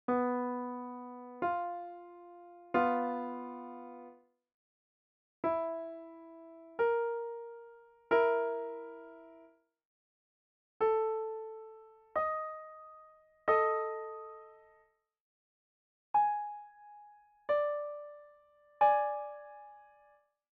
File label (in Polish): Trytony